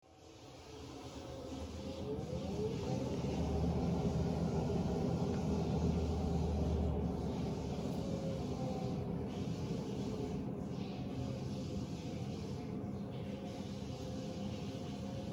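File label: gas boiler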